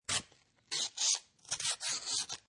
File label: Paper Knife Cut Paper, Cutting paper, slice paper with a paper knife.; Classroom; Schools